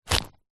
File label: purse pouch leather containing coins and notes grab pick up; foley